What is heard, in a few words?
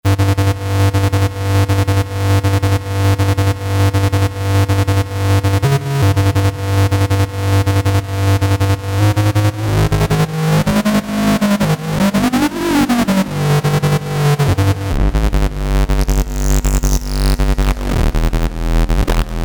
Novation XioSynth 25, Wired X-Gator